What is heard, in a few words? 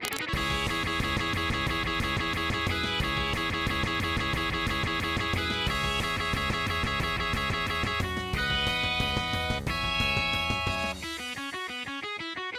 with guitar